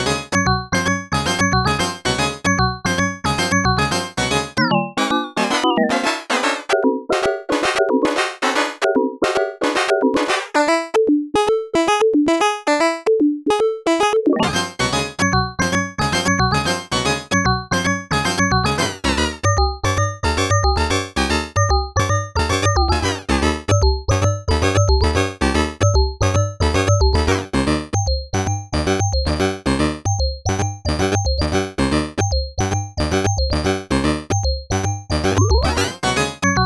Rossum Electro Music Panharmonium as Oscillator Warp it